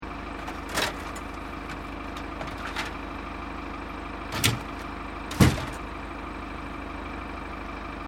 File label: Tractor Earthmover Idle Gear Loading; Vehicles; Tractors